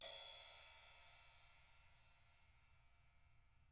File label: CYMBAL KITS